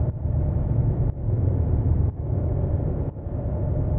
Atmos 120, sidechained

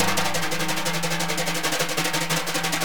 TIMB BR HI, Synclavier Percussion; Synclavier Percussion 2; World & Orchestral; ILIO

